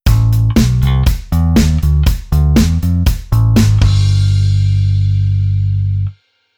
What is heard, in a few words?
Bass